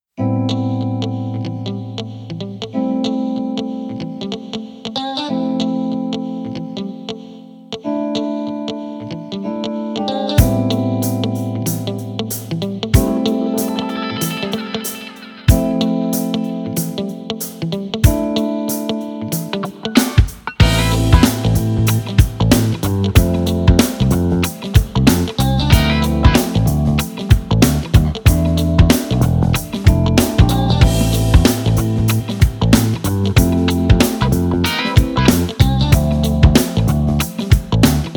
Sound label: Acid Jazz